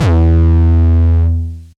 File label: 808 Dist - E1, Kicks; Mbase 11 Kicks